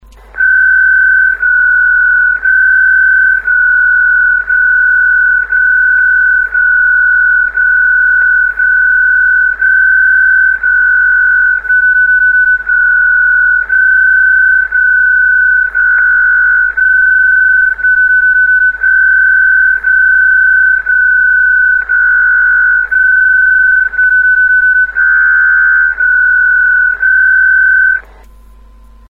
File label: Throb-X1, digimodes